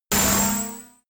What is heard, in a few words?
sci-fi laser shot